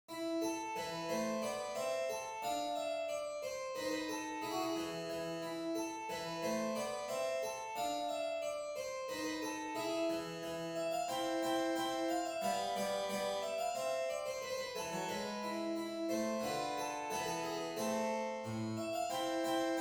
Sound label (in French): rigaudon mineur